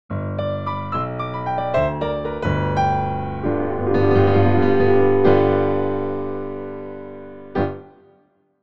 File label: Famous Lick, piano; hymns